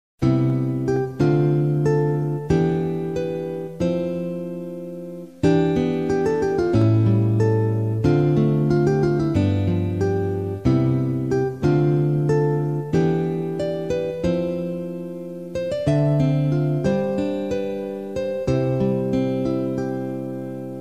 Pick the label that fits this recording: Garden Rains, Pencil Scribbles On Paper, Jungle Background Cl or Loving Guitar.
Loving Guitar